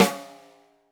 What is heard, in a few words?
Snare, Roland TD-20